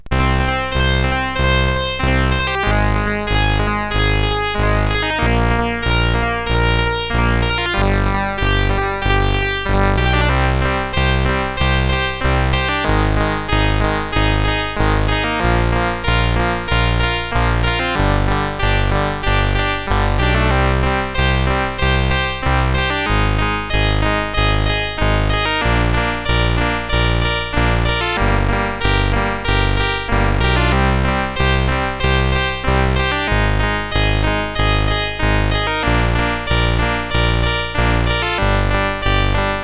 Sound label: Simple Tune sid CSG8580R5